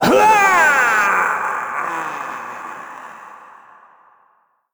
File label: death; Crypt of the NecroDancer